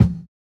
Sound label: Kick, jungle breaks drum sounds; ORIGINAL JUNGLE BREAKS; JBK KICKS